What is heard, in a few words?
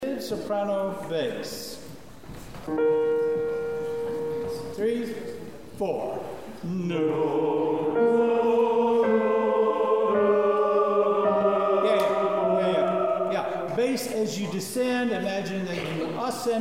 SB learning; rehearsal files